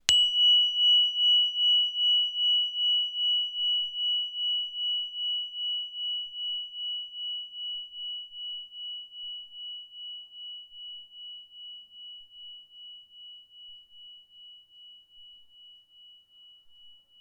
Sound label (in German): Jupiterton